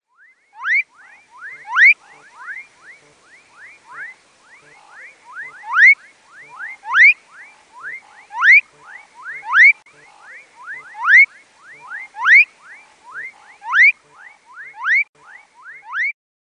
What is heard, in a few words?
Leptodactylus fuscus